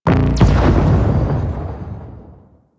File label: Laser Shot; Warframe; Ambience; Rail Jack; Pluto Charon Region